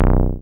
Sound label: TB C2; Best Service Gigapack 1; SYNTB303